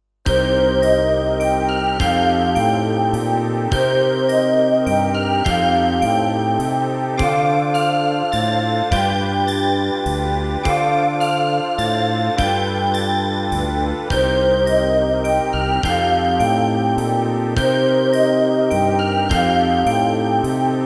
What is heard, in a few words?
Pads